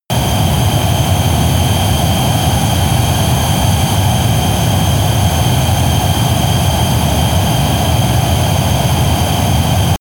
military jet fighter turbine steady noise

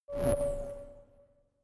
notification message synth buzz

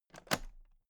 toolbox latches open regular

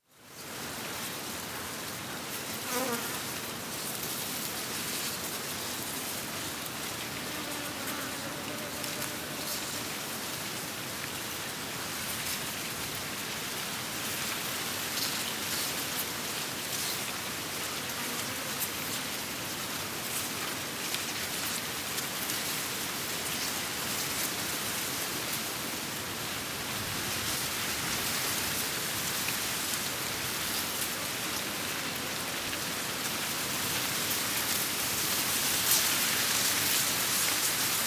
flys wind grass

